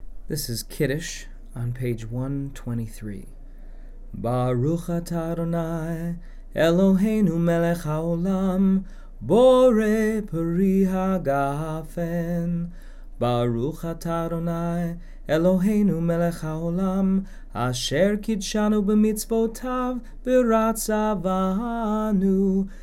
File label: Kiddush